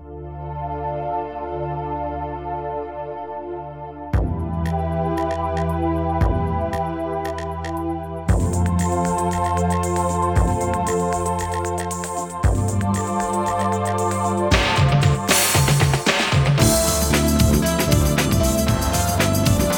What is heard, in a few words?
Dance